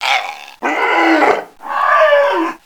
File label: trex; GEOGRAFIA; trice